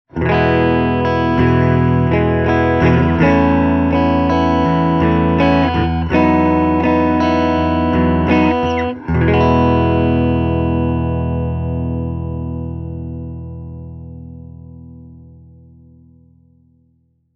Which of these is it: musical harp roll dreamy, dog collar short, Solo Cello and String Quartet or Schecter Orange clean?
Schecter Orange clean